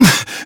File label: breathing hurt